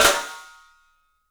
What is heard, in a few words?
PICSNF, 3D Drums, PICOLO FLAM; 3D Sound